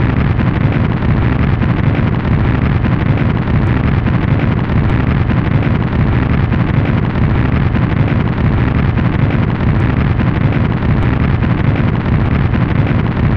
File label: roll; Wanted